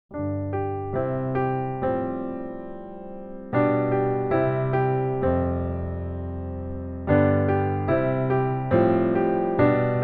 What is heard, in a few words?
piano short